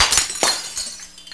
BRKN GLAS

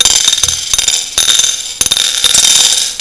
COINS OUT

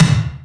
TOM; DRUMS

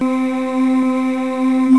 MECHO HH

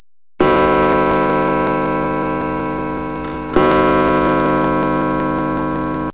OLD CLOCK

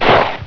PLOP

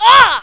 ooaa

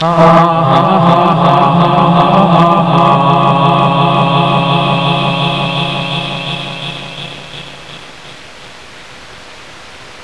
HA HA

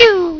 LASER

